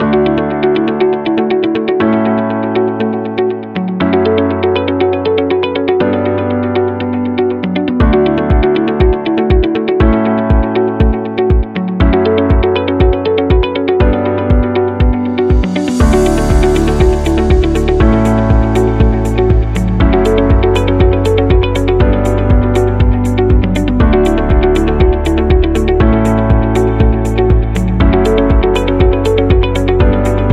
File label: Corporate Background